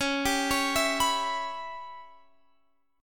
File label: Db Minor 7th Flat 5th Db down, chord; arpeggiated